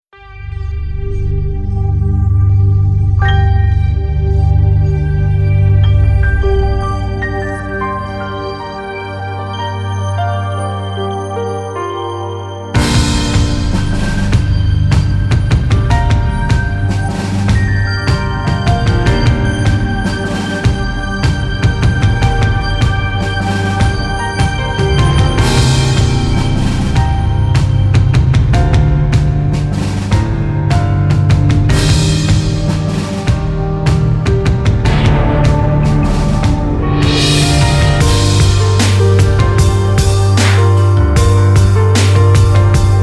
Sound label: hiphop main stream